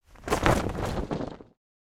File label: Flag Flap; ninjago